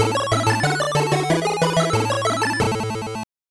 Level Clear; Robocop Versus The Terminator; Game Gear

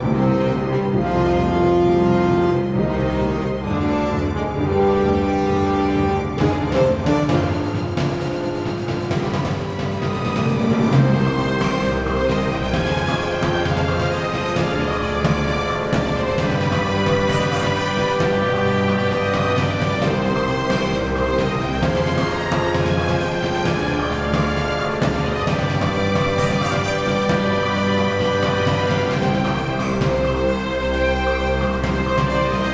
music cave

